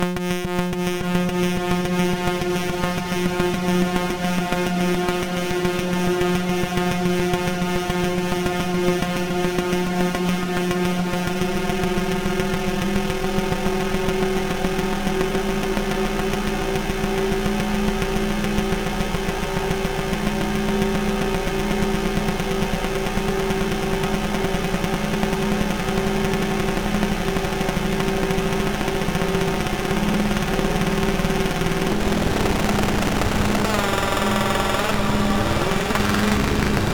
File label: Drone rungler benjolin shimmer